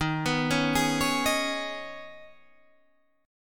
D sharp 7th Suspended 4th Sharp 5th, Guitar, Standard; D#7sus4#5 arpeggiated; JGuitar